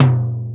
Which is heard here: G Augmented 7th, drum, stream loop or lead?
drum